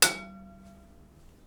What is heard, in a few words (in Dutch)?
Metaal